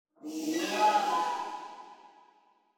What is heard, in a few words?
Cry; Vental